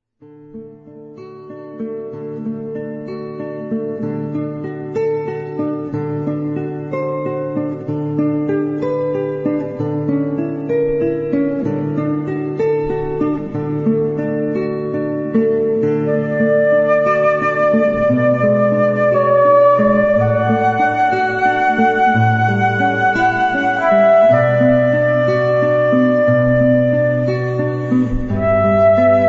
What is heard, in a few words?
Ave Maria, Flute and Guitar; Schubert